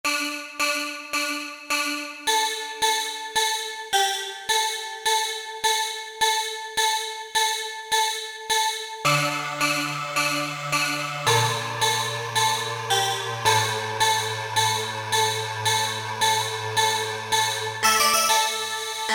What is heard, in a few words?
Vox Machina, Alesis Z2 EuroDance